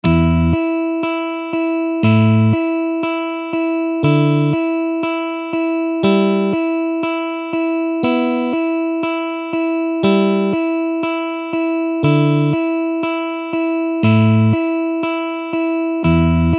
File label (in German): Zupfübung; pfadfinden